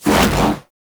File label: Hoverboard Jump Spin Fast A; Vehicles; Warframe; Venus Hoverboard